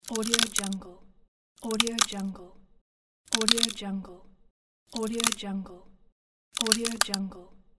wood crackling break

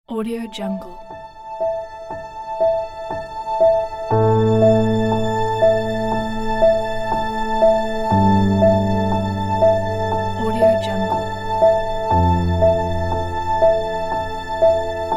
sad piano; rolikmusic

